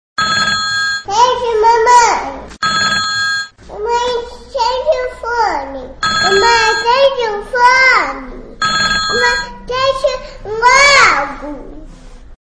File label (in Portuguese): Toque Pra Celular, Brega; Zuacao; Vinhetas; Atende Mae JM